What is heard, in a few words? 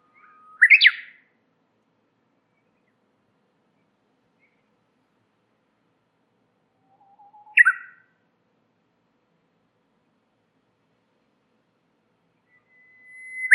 warbler; animals